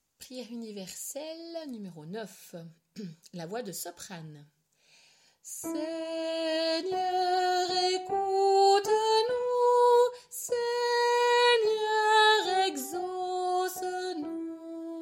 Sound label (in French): soprani